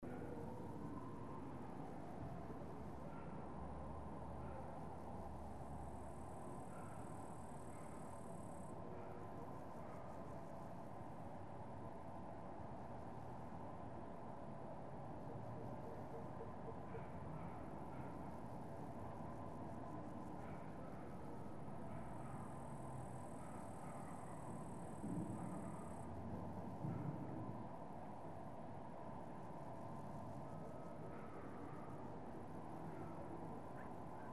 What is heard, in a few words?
Hood Ambience